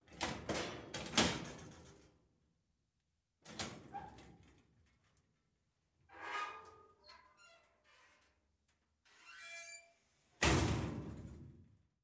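Locker open close W Slam, Medium Distant, Interior Pov.; lockers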